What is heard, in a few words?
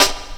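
snare drum, Drum & Bass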